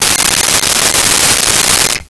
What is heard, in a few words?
Cell Phone Ring; Communications